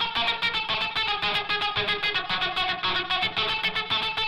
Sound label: crill loop